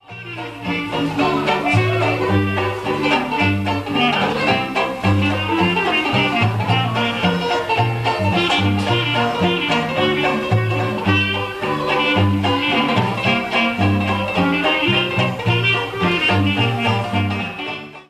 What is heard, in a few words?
acoustic trio banjo sousaphone clarinet